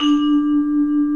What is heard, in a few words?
bell, 3D Vibes Hyb; E-MU Producer Series Vol. 5 – 3-D Audio Collection; 3DSprints